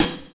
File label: Track HiHat, drums; Sonic Foundry (Sony Creative Software); Drums & Drones; Construction Kits